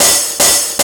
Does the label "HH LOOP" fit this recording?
Yes